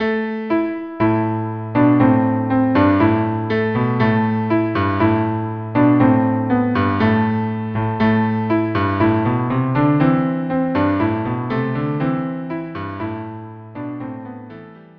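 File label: cello duo; quen a omagen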